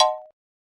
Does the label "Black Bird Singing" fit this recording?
No